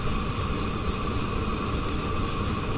Gas Burn Loop; Legend of Keepers (gamerip) (2019)

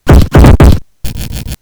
Foot dance; Knight Online

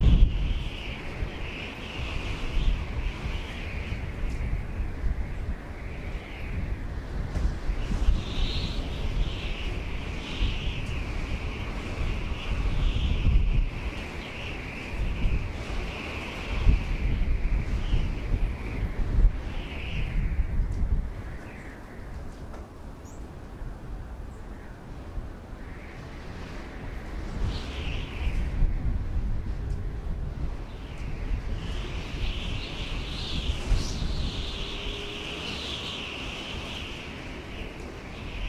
Desert Sound Mix; Circle Empires Rivals

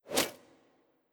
Human Hunter Throw; Human Hunter Throw4

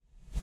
Photo General Focus Down

